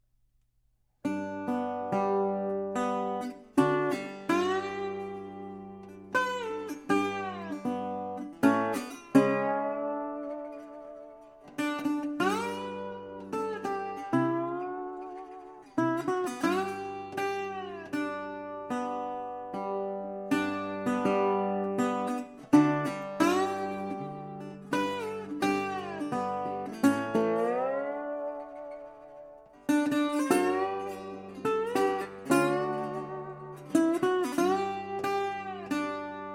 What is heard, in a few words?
National Anthem on the 1928 National Steel Dobro